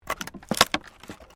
WOOD PLANK TWIST

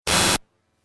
Static Glitch; sound design

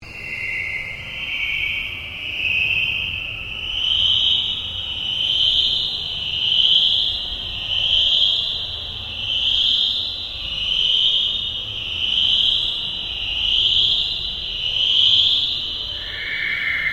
Ambient Drone Slow Sweep

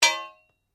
Blade Heavy Moving Impact, Sword; Foley sounds for melee weapons; Swords; Combat; SoundEffects-Foundation1

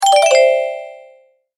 multimedia tone alert

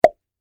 Foley Champagne Cork Pop

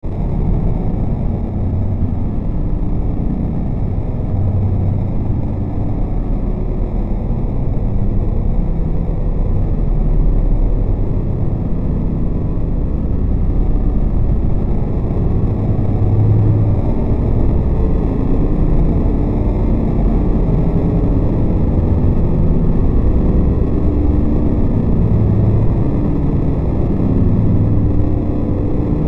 Bed Short Digital Static Fuzz; Blastwave

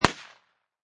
Machine Gun Uzi Model A Single Shot

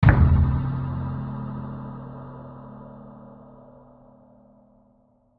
Hit Warble Deep, Hit Warble Reverb Deep Airy; Sound Design; Production Element Imaging Element Accent Transition; Warbles; Blastwave

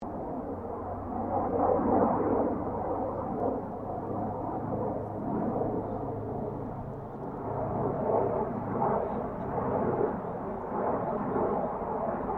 AIRCRAFT GLOSTER METEOR F MK8 PASS DISTANT; Gloster Meteor figter jet; Airplanes (Sound Effects:Vehicles)

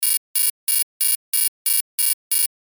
ALARM BUZZ LOOP, Electronic Device; Electronics; Blastwave; Alarms; Sound Effects:Electronics

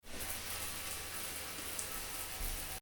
Shower on, Shower, Light: Switched On; Bathroom; Household

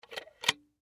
Door Lock Latch, Door Lock Latch Fast; Locks; Household; Blastwave

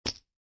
Poker Chips Dropping On Playing Cards; Poker, gambling, chip

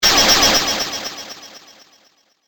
Echo Blasting Laser Gun

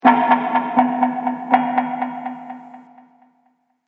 Dark Horror Hits; impact; Miscellaneous Impact Sound Effects; Impact / Explosion Sound Effect; Andrew Fleming

